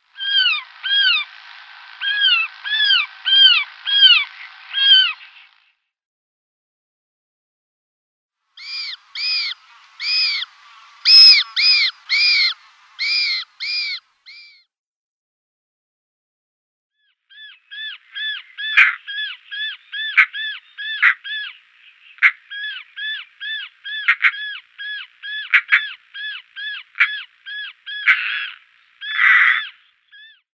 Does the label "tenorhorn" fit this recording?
No